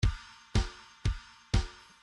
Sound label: bdf midi file grooves rock b rock b